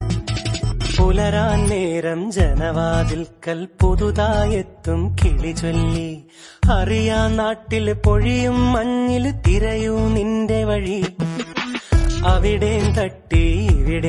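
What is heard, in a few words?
Ringtone, Malayalam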